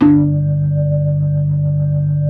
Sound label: B-3, Blues